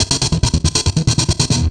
Treat Guit, Industrial Nasty

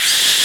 Crowd Chanting; Kick Off 3

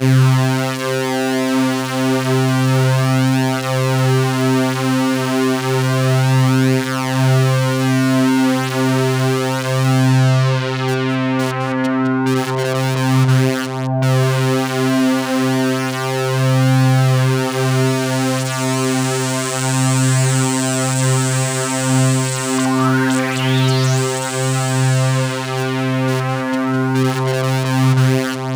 C2, Roland JV 2080 CLUB ORGAN

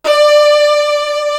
SOLO VIOLIN; East West; Global Sounds

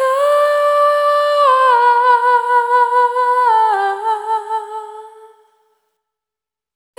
Single Voice Melodic Loops, Vocal Samples

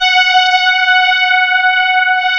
CMI ORGAN

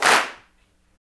CLAPS, Africa Handclaps

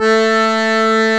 TACK PIANO

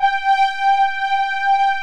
smoothies, pads; Pro-Rec - Synthsonic

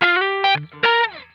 GLIDE, Funk Guitar; Zero G - Funk Guitar

